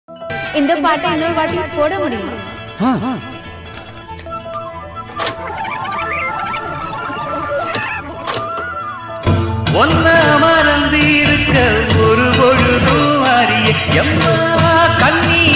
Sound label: tamil old song; Karakattakkaran movie